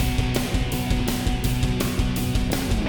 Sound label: Boss Fight Phase 2 MIXED; Ben 10; Weatherhead Prime